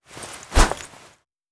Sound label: shadow grenade throw